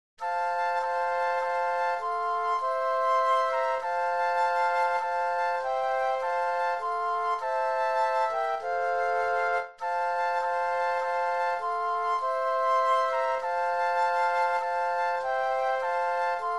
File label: Flute Trio; christmas